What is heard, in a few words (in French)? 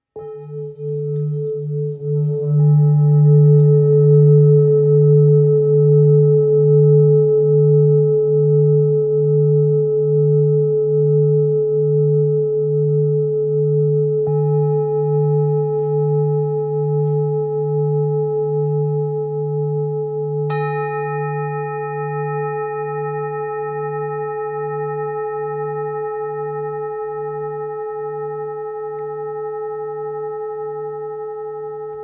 Bol super lune belier la